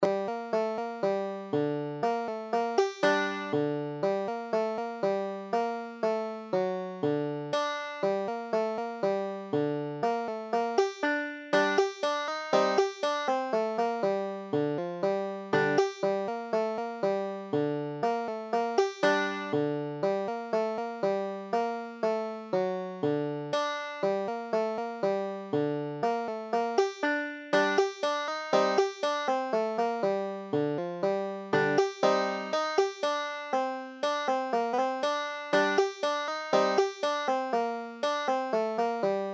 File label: down by the old sawmill, banjo